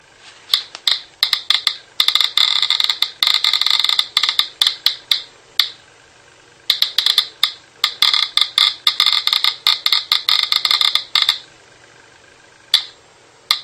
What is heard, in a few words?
Geiger Counter Sound